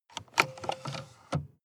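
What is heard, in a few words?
sports car interior drink holder open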